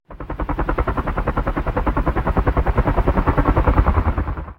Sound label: battle helicopter